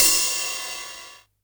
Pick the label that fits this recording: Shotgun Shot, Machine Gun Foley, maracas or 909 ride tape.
909 ride tape